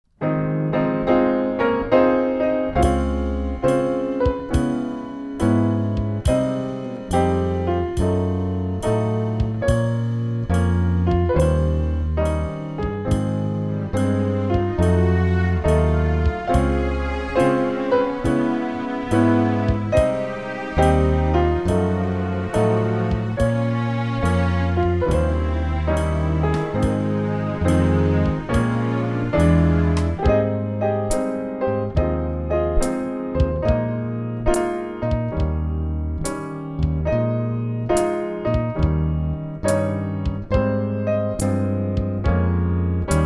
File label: Slow Dance